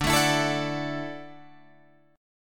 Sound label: D-Major Add 9th D down Guitar Drop D